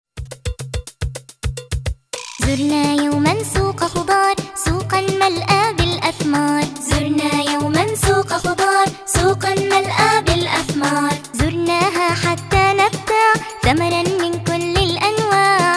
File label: zurna; enshad